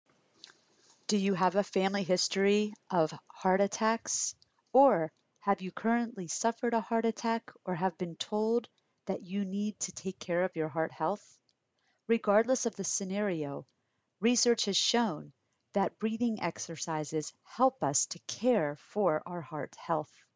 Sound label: Breathing